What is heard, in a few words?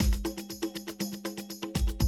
120, Classic Drum Loops